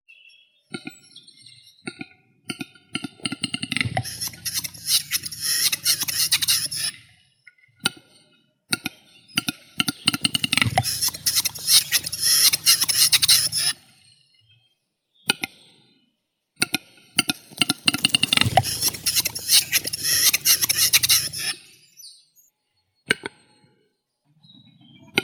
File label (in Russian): gluhar tetrao urogallus